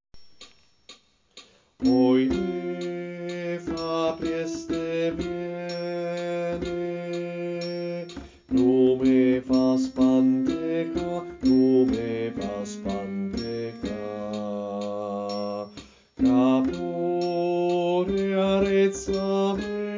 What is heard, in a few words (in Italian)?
Contralti, Coro; Coro Isola del Giglio